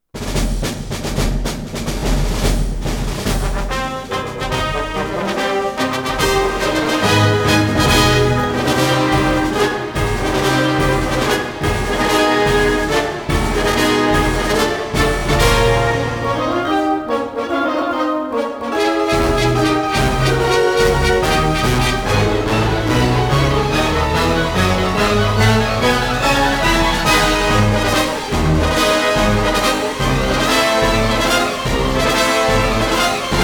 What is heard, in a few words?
Battle